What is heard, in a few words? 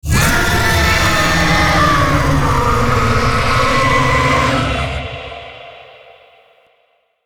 Vocal Death; Warframe; Zariman; Void Angel